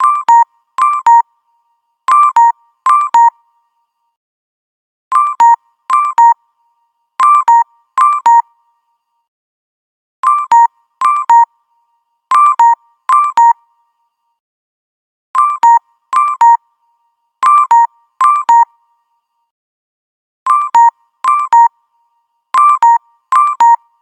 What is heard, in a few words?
Hello, ringtones; LG; X Screen